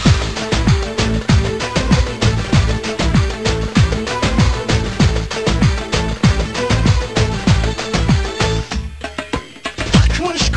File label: music; mapsmirror